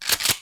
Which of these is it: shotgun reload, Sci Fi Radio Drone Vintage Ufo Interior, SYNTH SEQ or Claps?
shotgun reload